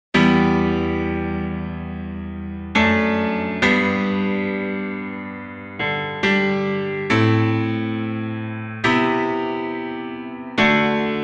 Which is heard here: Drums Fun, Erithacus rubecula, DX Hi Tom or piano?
piano